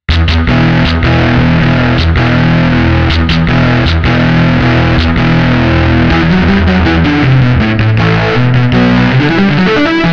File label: Guitar After